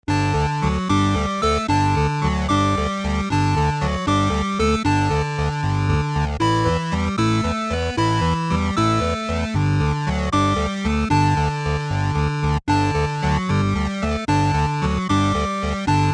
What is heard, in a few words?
Game Faster Walz Loop